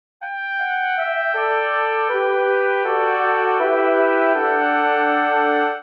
Phrase Descending Resolution D Major 80 bpm, Brass Quartet; MIDI Clips; Ableton; Spitfire Audio